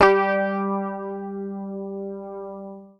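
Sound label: bass, G3, left channel; PICK; Sampleheads; Will Lee Bass Library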